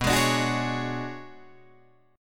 B Minor 6th Add 9th B, Guitar, Drop D

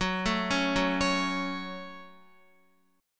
Gb Minor, Guitar; arpeggiated; x,9,7,6,6,8; 4E,4A,5D,5G,5C,6F; JGuitar

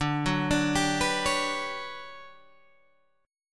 D Major 13th D down Guitar Standard; arpeggiated; capo 9

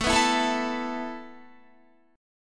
A Suspended 2nd A Guitar; Asus2; strummed

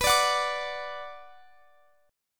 B Minor B Guitar Drop D

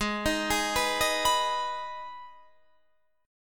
Eb Suspended 4th Sharp 5th Ab

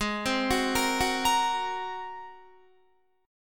G sharp 9th G sharp Guitar; G#9; arpeggiated

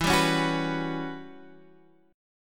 E Minor 6th Add 9th E down Guitar Standard; Em6add9 strummed; JGuitar

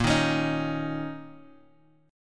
A sharp Minor Double Flat 5th; A#mbb5 Chord {6 6 X 6 4 X} strummed

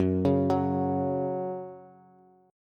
F sharp 5th F sharp Bass

